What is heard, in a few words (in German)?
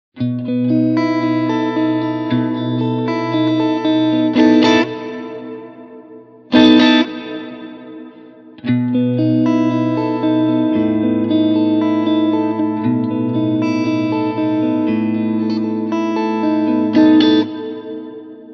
Hall